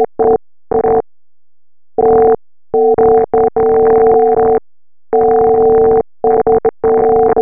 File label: synth; WET Data